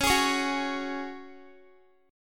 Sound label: Db Suspended 4th Db, Guitar; strummed; x,16,18,18,18,x